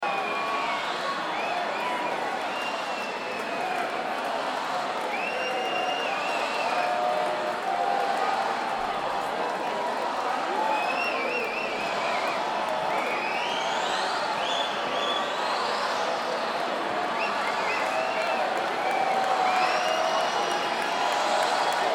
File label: Crowd